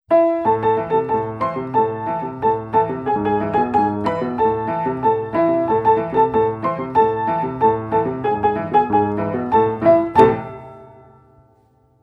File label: piano